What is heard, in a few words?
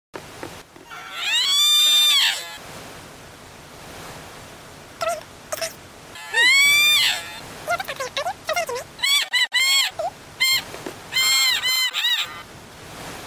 aaaah